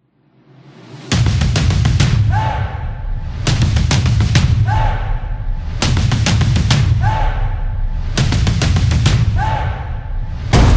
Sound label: Japanese Style